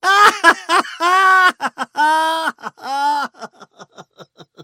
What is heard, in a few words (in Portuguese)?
risada comica